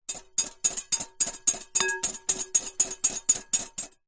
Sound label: food whisk metal whisking eggs in glass bowl